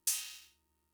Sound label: Cymbal; Fricke MFB-522